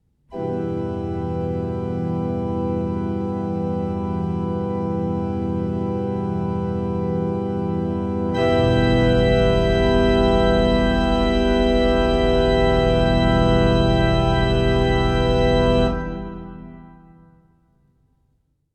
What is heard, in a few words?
chords